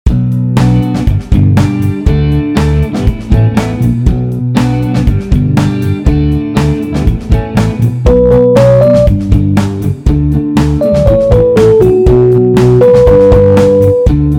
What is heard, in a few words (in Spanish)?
Musica ligera